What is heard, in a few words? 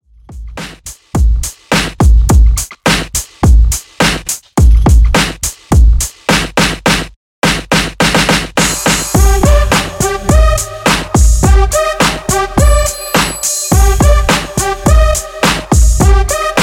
Remix 105 Bpm; Redrum